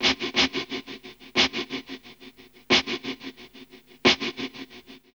Hip Hop R n B; USB Soundscan vol.19; AKAI

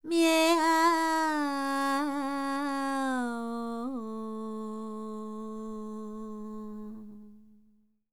World Voices, INDIA; ILIO; Vocal Planet

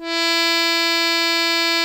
accordion E3; InVision Interactive; Lightware VOL-1; Instruments & Percussions

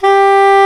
SAX SOP MP G, Soprano Sh

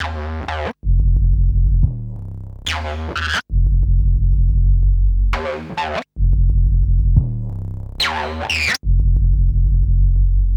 Bad Bass, Drum & Bass; Fluid Dynamics

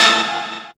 DR. LOOP; X-Static Goldmine 3; CHORDS

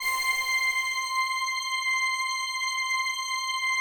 PAD, DIGI-PADS

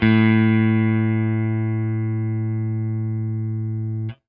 FENDER HRD, Guitar & Bass; Quantum Leap

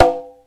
CONGA SET; OMI - Universe of Sounds

